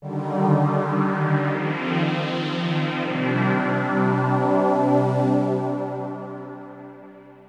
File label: SYNTHPAD AMBNT 160 C; Ambient; Sphere